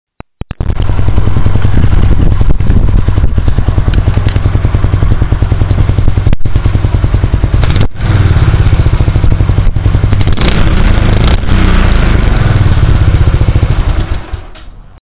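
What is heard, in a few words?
Savage Stock, exhaust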